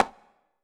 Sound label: conga slap; Greytsounds; Scott Peers Sound Engineering